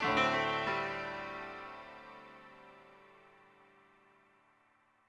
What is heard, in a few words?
ethno, 90, D